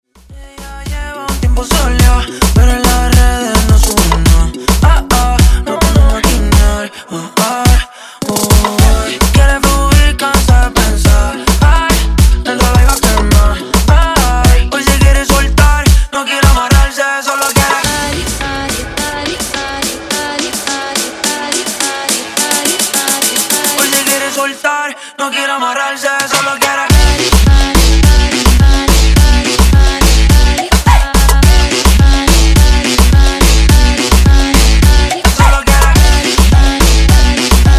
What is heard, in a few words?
Funk Hybrid Segue, 106; 128Bpm